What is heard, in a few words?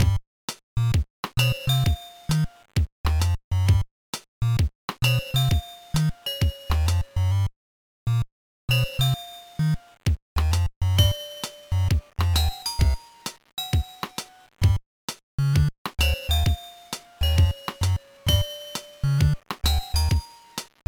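background music; No No No Puzzle Chailien; GBA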